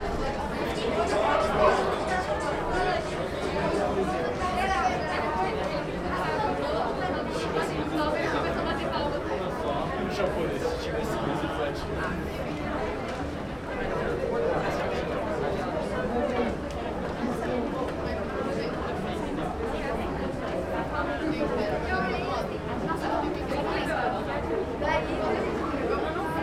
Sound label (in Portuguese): Ambiencia tunel do tempo escada rolante com poucas pessoas e passos; tunel do tempo do congresso nacional